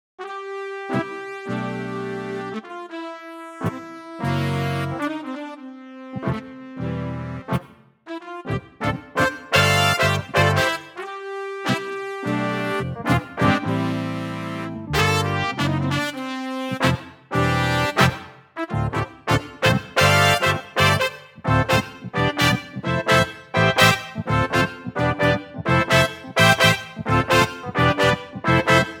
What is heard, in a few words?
Brass; Dexi